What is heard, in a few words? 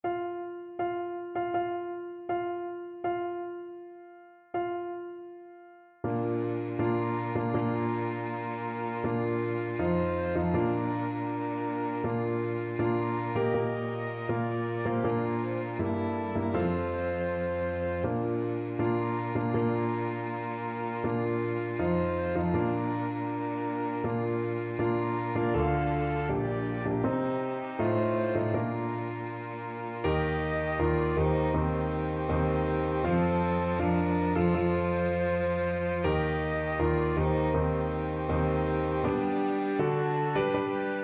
Bridal Chorus